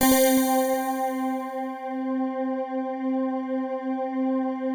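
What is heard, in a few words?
PAD, atmospheric pads; Best Service; XX Large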